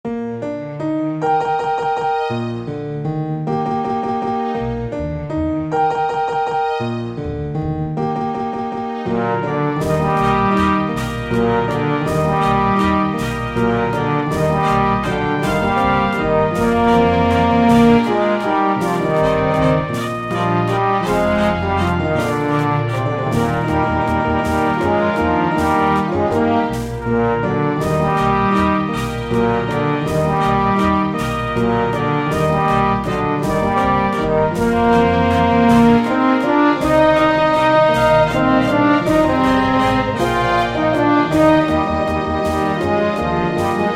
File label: karaoke